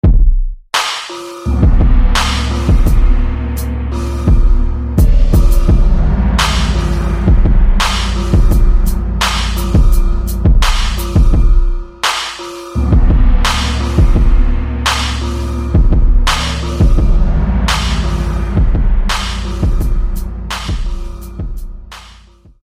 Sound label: Gong of the Ancient